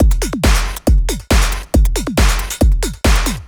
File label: Dance Drumloop; Drums; Dance Beats